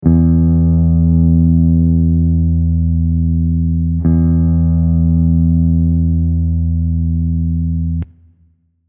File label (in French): corde